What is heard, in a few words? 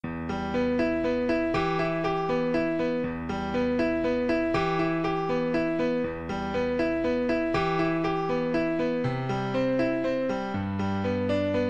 Vltava, Trombone